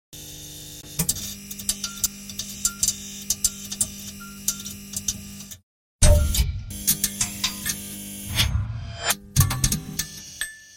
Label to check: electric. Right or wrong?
Wrong